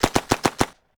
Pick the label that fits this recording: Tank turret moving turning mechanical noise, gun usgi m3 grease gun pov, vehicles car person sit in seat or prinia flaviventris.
gun usgi m3 grease gun pov